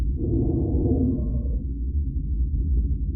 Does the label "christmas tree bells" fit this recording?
No